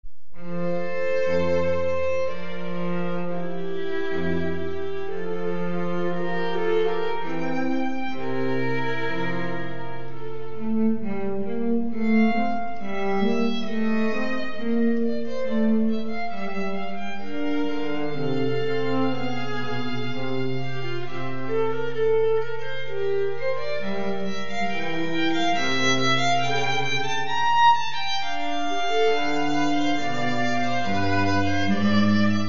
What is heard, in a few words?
bach adagio prelude fa majeur